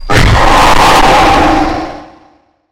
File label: screamer; golden leopold